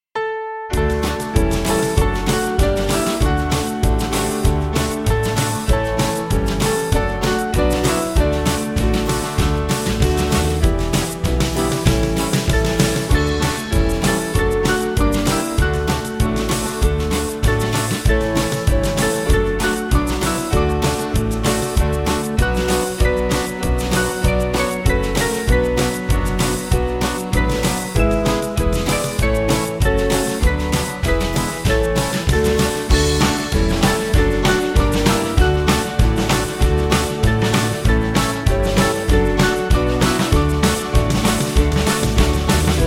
I Choose Thee, Band; Mackenzie

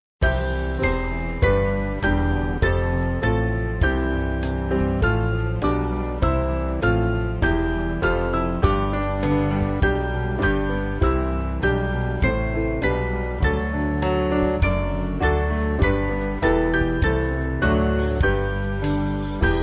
Band, Music Backing Files for Churches

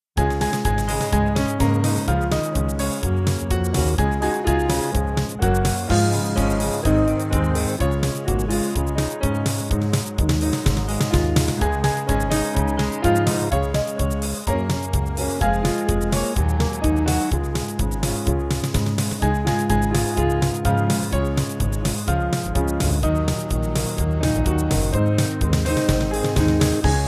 Band; What A Mighty God We Serve

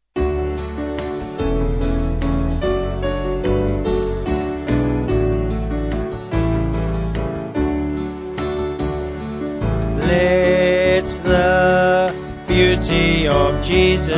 Vocals Band

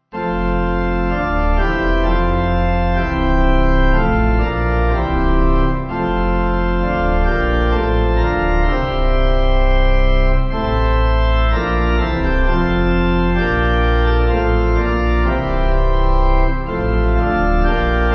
O Son Of God, Pipe; Twenty-Fourth

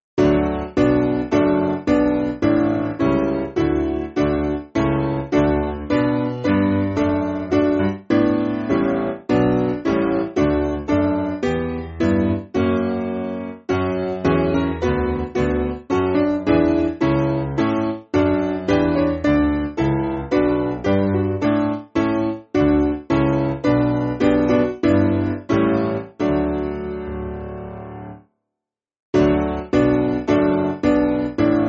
Piano; Sing Praise; Weman

